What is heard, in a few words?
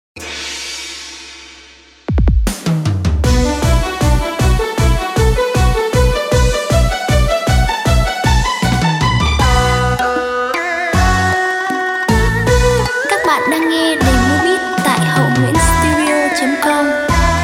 beat, playback